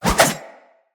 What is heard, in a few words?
Pole Arm Spin Whoosh; Weapons